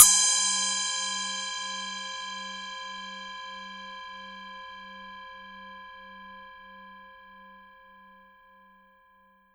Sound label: chocolate fountain